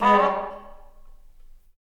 Band Hit; Ed Edd n Eddy; Adromulus Pack

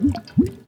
Water - Jiggle Wobble; Ed Edd n Eddy; Adromulus Pack; EEnE

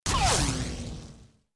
Bullet miss

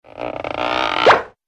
Squeak And Pop

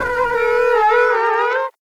Slide longer; Ed Edd n Eddy; Adromulus Pack; EEnE

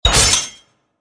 Sword Attack

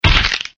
Bonecrack; Physics

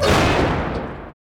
Impact; Ed Edd n Eddy; Adromulus Pack

